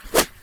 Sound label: arrow fly by; SFX for ShowReel 2014/2015; Liam Gilchrist